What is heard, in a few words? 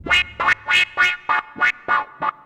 Beatnik Hop; Sonic Foundry (Sony Creative Software) - Stylus Pressure Urban Grooves on Digital Wax